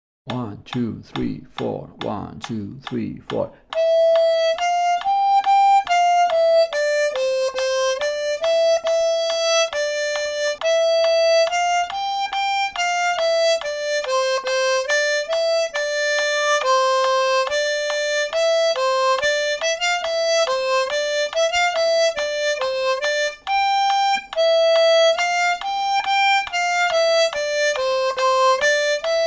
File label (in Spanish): ODE TO JOY, armonica